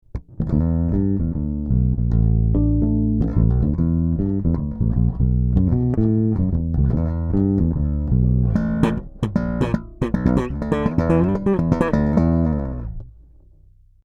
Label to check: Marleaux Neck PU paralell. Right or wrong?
Right